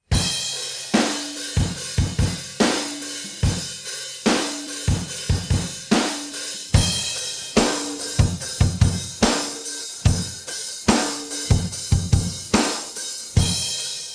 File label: pattern, drums